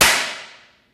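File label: physics clipboard impact large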